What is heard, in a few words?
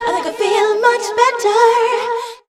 i think i feel much better SUNG